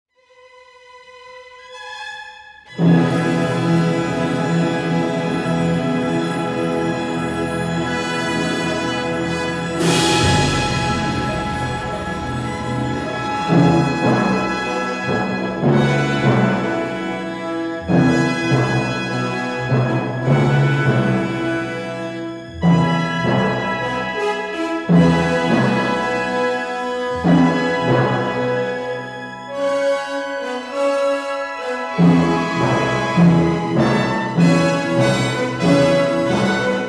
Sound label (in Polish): napięcie budowane jest powoli i konsekwentnie aż do wielkiej kulminacji; Horenstein; IV Symfonia Gustava Mahlera